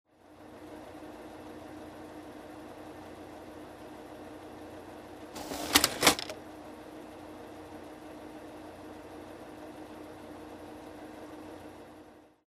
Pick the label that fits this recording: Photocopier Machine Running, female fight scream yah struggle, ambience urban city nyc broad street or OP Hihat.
Photocopier Machine Running